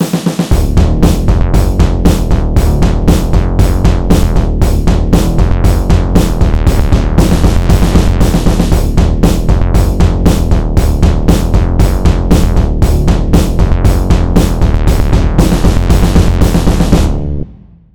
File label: End Tank Wave